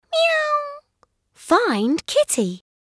meow; puppys nose; find kitty

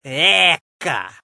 incorrect response; codeapillar

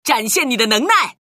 rocktopus sample jazz

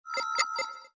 Motion Detect

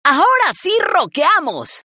rock; rocktopus

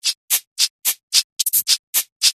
Maracas Solo; rocktopus

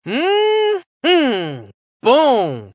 correct response; codeapillar

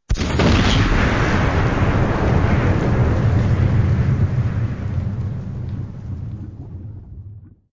Volcanic Kaboom

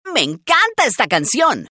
jazz; rocktopus

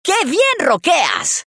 jazz; rocktopus

